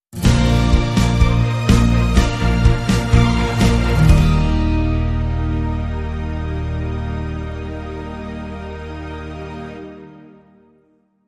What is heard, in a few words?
Sting No Electric Guitar